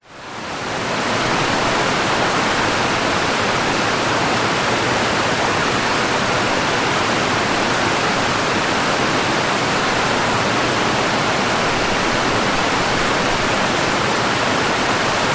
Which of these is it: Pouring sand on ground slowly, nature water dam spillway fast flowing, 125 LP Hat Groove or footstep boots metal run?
nature water dam spillway fast flowing